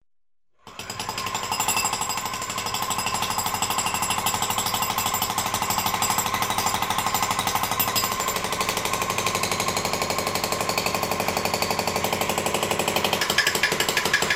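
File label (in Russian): строительство, электрический отбойный молоток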